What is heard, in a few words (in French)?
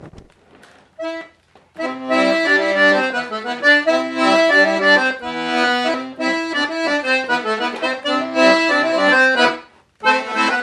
Rigodon de Remollon Theme accordeon